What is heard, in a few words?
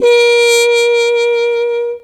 Horn Loop